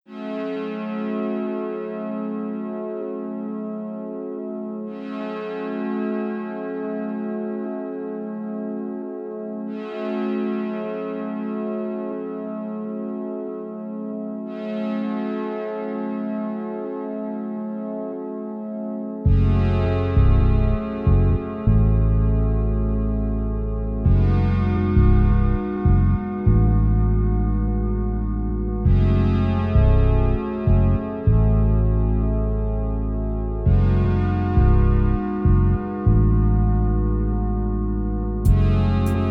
magic music ambient 432 hz; magic earth

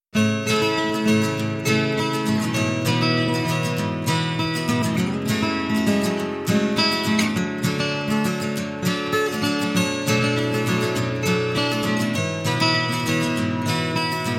acoustic vibes 432 hz

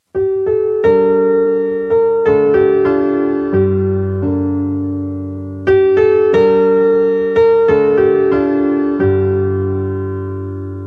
piano music, 432 hz; SPOJRZENIEM CIEBIE WOLAM

